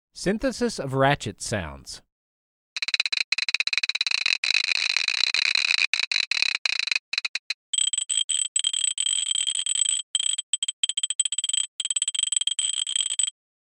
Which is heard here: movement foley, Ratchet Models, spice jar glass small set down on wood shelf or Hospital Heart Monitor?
Ratchet Models